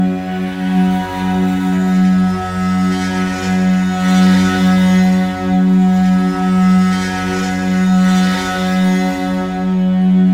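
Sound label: music temple chord; Shangri La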